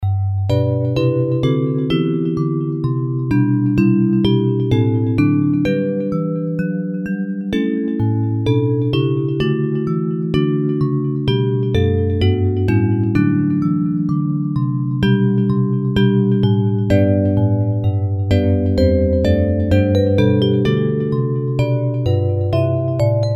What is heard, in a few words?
All Praise to Thee for Thou O King Divine Bells